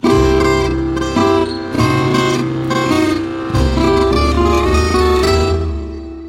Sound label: waltz excerpt half speed